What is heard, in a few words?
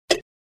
UI invalid sound